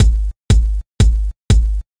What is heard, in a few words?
kick